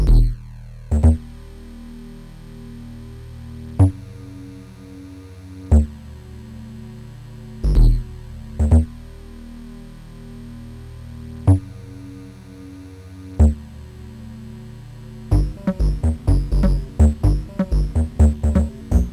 ambient hip-hop; Trackers; Modland

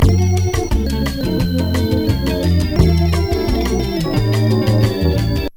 samba

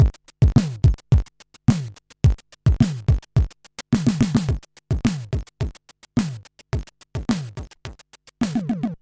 drums; AHX

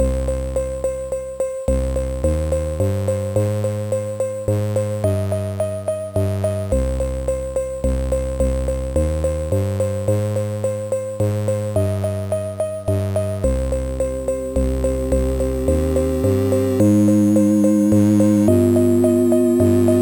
ding ding; MusicLine

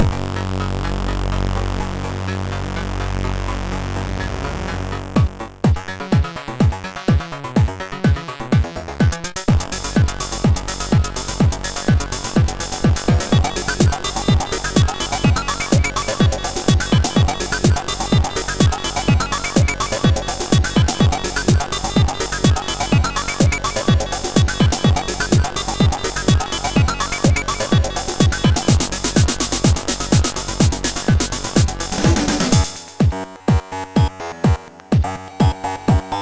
longing for techno, chiptune

